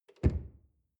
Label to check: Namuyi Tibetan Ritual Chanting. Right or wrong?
Wrong